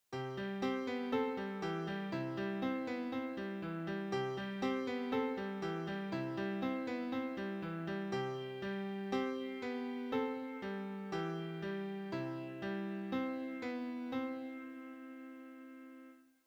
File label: augmentation